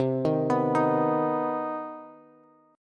C Minor Major 7th C Bass; arpeggiated; JGuitar

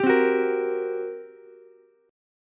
G Minor Major 7th Double Flat 5th, G, Ukulele; strummed; JGuitar

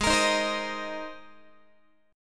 G sharp Major Add 9th, Guitar; G#add9 Chord

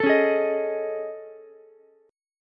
A Major Sharp 11th, Ukulele, C6; AM#11; strummed; JGuitar

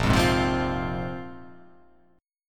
A sharp 7th Suspended 2nd down

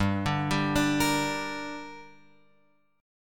G Minor G down Guitar Open F, chord; arpeggiated; JGuitar

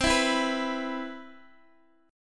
C Major 7th, C, down, Guitar, Open D

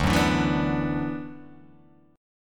D 6th Add 9th D

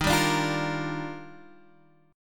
D sharp Major 9th

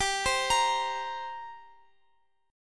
G Minor Double Flat 5th, G, Guitar, Open F; Gmbb5; arpeggiated; x,x,x,14,15,17

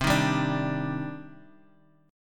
Eb Major 7th C; Ebmaj7/C chord; JGuitar

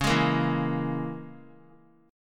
C sharp Minor 6th; C#m6; strummed; JGuitar

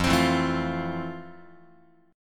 F Major 7th F, Guitar; strummed

